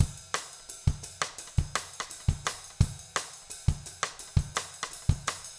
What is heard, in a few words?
jungle 170